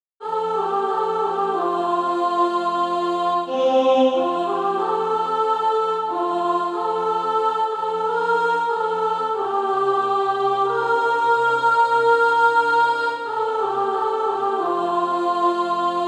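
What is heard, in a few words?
soprano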